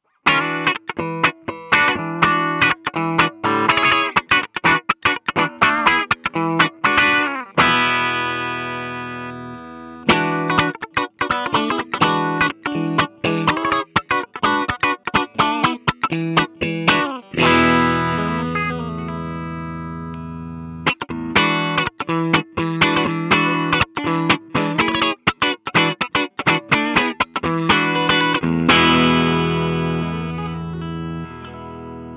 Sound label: JBjr clean